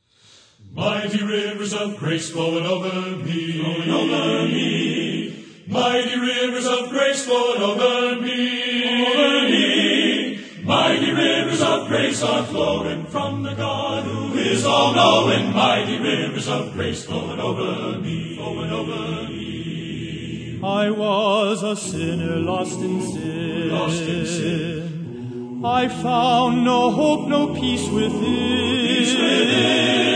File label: Men's Choir